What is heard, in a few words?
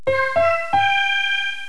ta ta ta